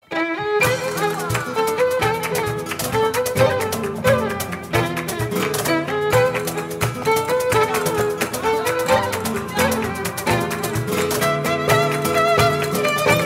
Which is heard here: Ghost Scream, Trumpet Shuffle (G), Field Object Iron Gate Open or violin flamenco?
violin flamenco